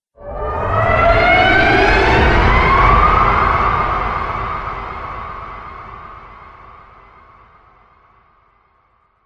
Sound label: Trailer Hit Horror Metallic Impact; Trailer Sound Effects